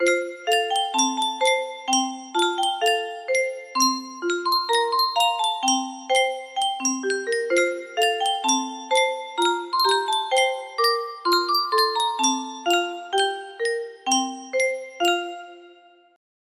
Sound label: yunsheng music box unknown tune